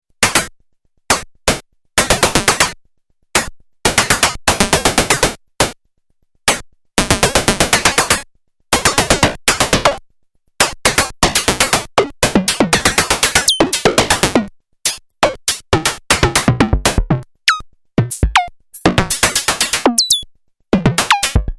Subsequent 37 FM Percussion

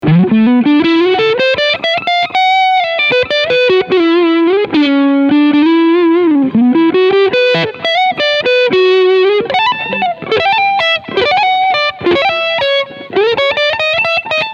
Crunch Front PU

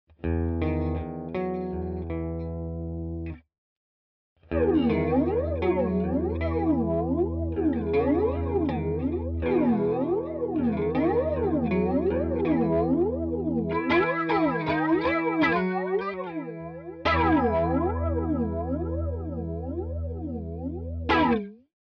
Walrus Fundamental PHASER Heavy weird

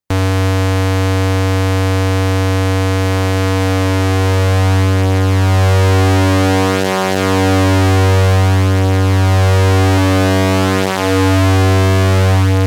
MYSEM PULSE

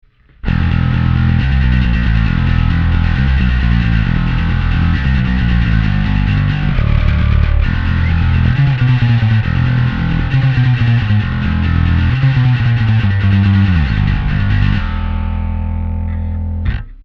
Darkglass Microtubes X7 Full On passive

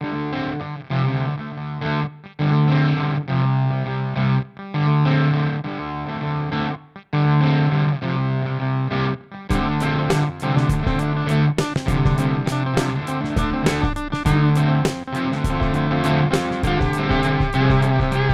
Ripped Speaker Fuzz Broken; EHX

